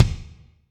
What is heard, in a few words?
Kik Solid RM, Drum Machines Alesis D4